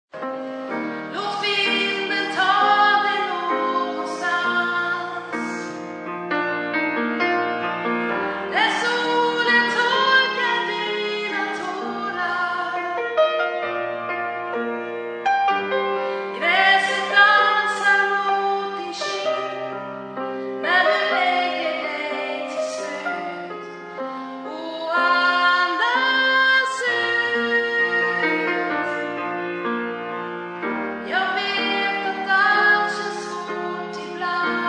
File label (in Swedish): ljusare moln bas